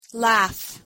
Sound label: laugh; audiobook; verbs